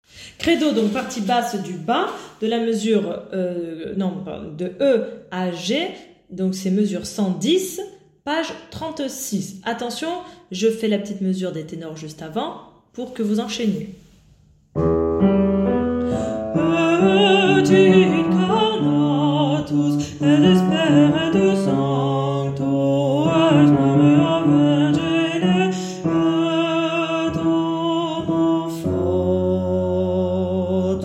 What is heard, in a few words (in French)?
partie basse du bas; Credo E à G